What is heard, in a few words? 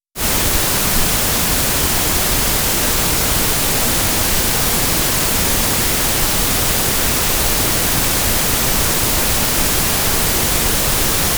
Pink Noise mono, Rosa Rauschen korreliert (mono); Kann zum Funktionstest verwendet wert, zur Balance-Einstellung oder auch zum Einspielen von Lautsprechern; Kanaltest